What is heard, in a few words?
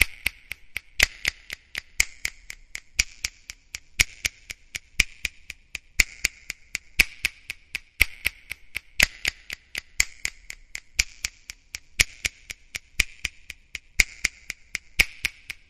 Eccentric Snaps Rhythm